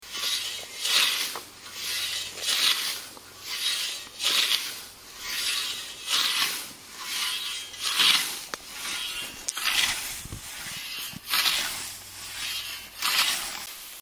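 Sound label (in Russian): kosa, scythe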